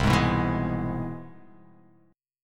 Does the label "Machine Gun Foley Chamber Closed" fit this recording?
No